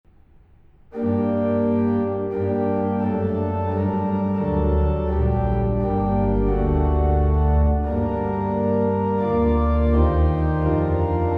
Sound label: windsor, hymns organ